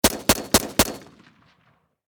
Warfare Machine Gun 5.6 Caliber Sig Sg 552 Commando Four Shots Distant Perspective